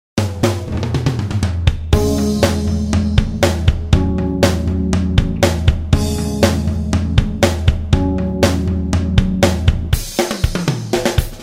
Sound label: bass